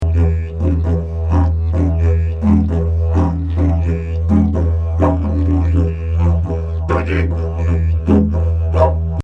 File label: Daytime Rhythm